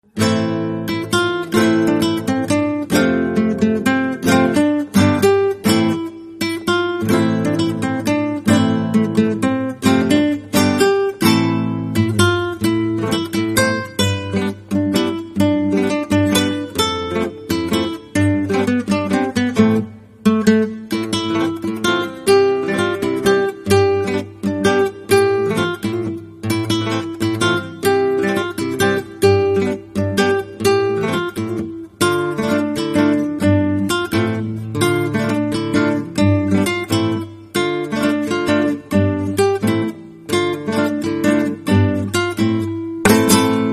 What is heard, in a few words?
Guitar